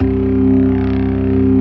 24 STOPS, organ; Keith Emerson Signature Series

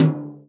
tom, Real Drum Kit, jungle kit

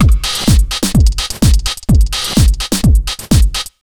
127 BEAT, Fresh Disco House; USB Soundscan vol.20; AKAI

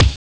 drum; ST-XX Amiga Soundtracker Sample Packs

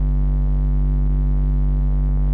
Electrical Noise; layering

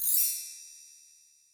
Bell, Percussion, Yamaha DTXpress

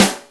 DR550; Best Service Gigapack 2

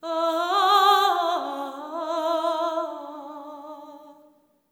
LAMENT

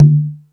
Latin Layers, drum machine

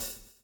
SWNG

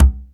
Cajon; EMU Orbit-3

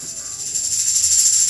Dance Drums; Best Service ProSamples vol.15; AKAI

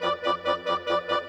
Bassoon, Clarinet Oboe; Sonic Foundry (Sony Creative Software); Orchestral VOL-2; Modern

